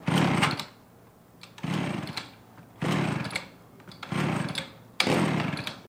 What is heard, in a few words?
Chainsaw Cord Pulls; chainsaws